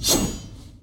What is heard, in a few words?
knife; mgs4; raiden